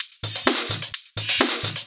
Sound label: House loops 128BPM; Vengeance Samples